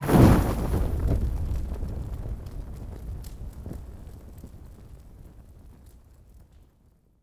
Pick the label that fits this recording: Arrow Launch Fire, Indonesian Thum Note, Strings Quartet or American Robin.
Arrow Launch Fire